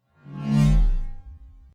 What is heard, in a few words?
Time Strech Passby Scifi Spacecraft Robot; Whoosh; Sound Design; Tom Hutchings